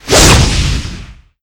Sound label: attack